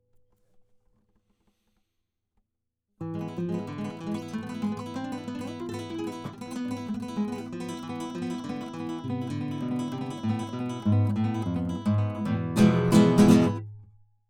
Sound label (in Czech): Kytara kobylka